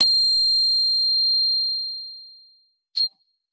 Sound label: guitar electronic, distortion guitar